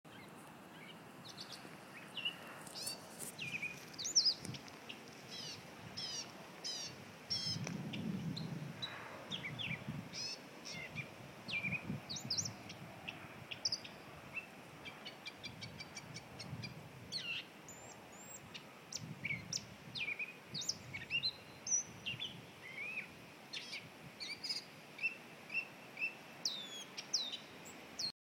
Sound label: Gray Catbird